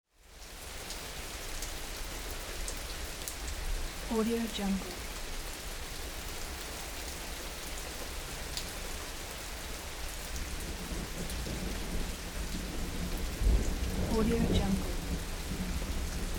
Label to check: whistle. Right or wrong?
Wrong